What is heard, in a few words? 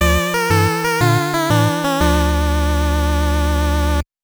gameover; panicpumpkin